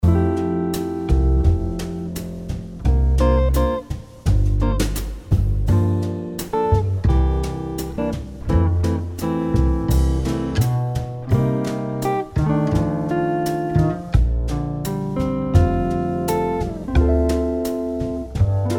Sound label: Jazz guitar